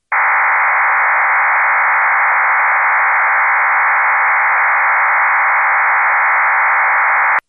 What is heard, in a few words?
FreeDV 700E; ham